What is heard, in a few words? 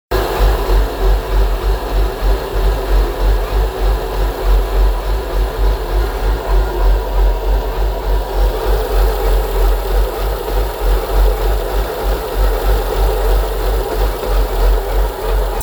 failsafe mode has misfire jag; XK8 XKR X100